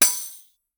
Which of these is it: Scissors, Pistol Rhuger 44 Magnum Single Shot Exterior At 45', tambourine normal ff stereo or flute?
tambourine normal ff stereo